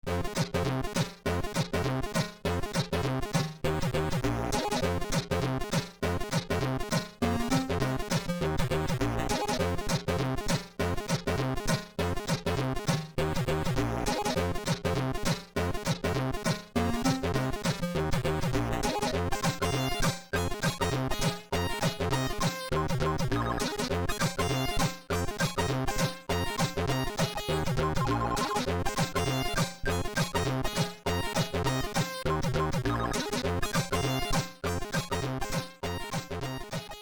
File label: guitaring; sid; Orcus